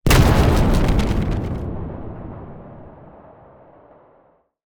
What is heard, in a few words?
Distant Sci-Fi Explosion